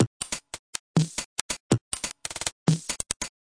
140 bpm, microcosm, drum loops and breaks

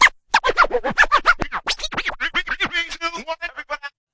everybody, scratching and turntablism; DRUM LOOPS and BREAKS

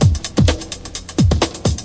129 oldskool break, DRUM LOOPS and BREAKS

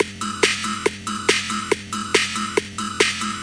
140 skittles attack, drum loops and breaks

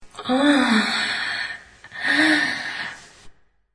sexy female gasp

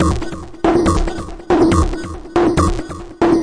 140 hexbeat, DRUM LOOPS and BREAKS

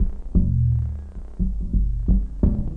mello triplet feel bass thingy

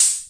hho, Hammond Drum Machine; DRUM MACHINES

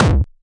nasty stormfront, Electro FX

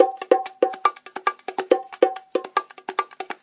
070 bongo lo hi, drum loops and breaks; 000 to 080 bpm

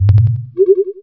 alien breath; Scars FNC SFX

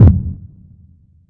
classic hip hop kick; STYLE KITS (Hip Hop, Etc); Classic Hip Hop Mega Kit

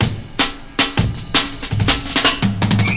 relax intro; FILLS and ROLLS

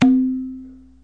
tun, Tabla

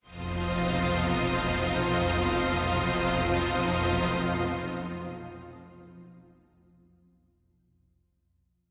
Heavy Strings F; PADS and STRINGS

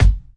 kiks

